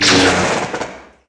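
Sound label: Light Saber wall, Contacts avec d'autres sabres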